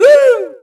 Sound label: Vocal Oneshots; Vengeance Samples; Vocals